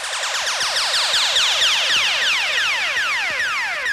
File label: zap, rave; Rubber Chicken Software Sample Bank